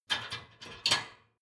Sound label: dishwasher frame fold up soft